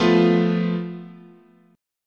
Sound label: F Major Flat 5th, chord